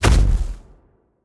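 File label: movement footstep; smite; god cthulhu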